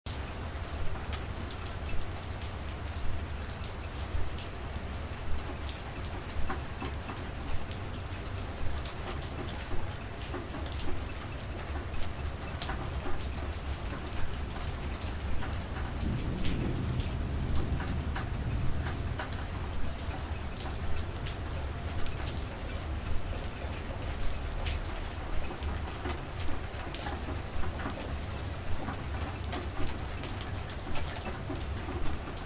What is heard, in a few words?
Rain; Blind Survivor